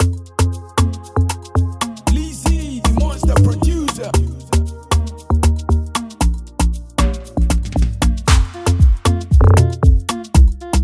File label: Afro Amapiano beat; BleazyBeatz